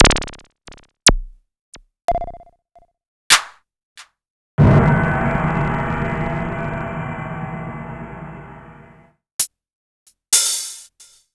Korg Electribe ER-1 Kit

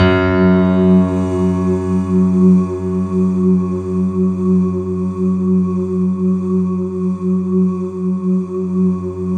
F#2, Synth Line; 90 s Sample CDs; NiceBeats; SynthLine VOL-22

